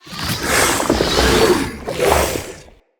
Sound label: Attack Swing, Vocals; Enemy; Characters; Corrupted Sproutfolk